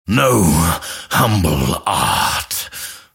attack pain; dota2; grimstroke

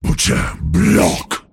deny pain; pudge

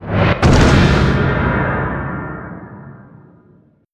shield crash with buff; Dota 2

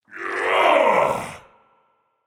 Grunt Physical; Realm Royale; Assassin; Chained One

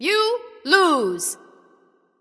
Death; Pixel Buster Freya

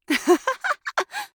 Mage Laugh; Realm Royale

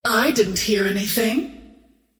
scream whiff; Queen of Pain

